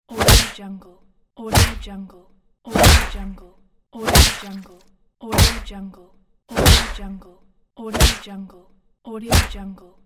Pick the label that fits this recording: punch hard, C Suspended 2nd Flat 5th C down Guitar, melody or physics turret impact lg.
punch hard